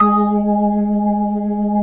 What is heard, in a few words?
B-3 G#4, Jazz; Keyboards of The 60's and 70's